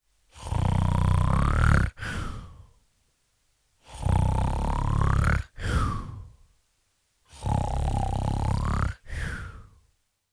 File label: Snore